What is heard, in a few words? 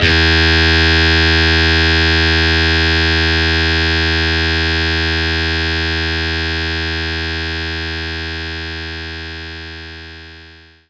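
Distortion Guitar E2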